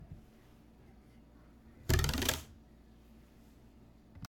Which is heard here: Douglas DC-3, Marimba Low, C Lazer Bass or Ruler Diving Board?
Ruler Diving Board